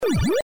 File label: bonus; pacman